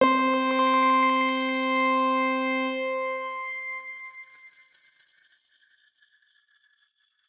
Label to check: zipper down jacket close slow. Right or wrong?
Wrong